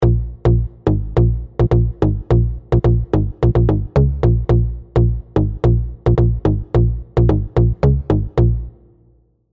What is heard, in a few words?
BASSES DEEP BOUNCE